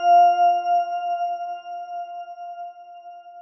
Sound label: Bright; Okitoki Games; EasyTalk